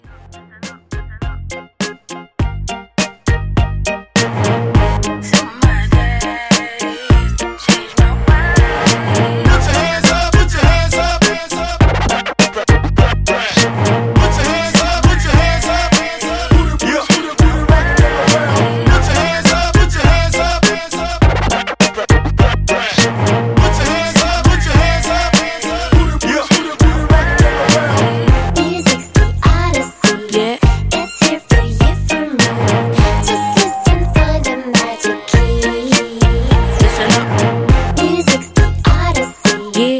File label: Hype Scratch, 102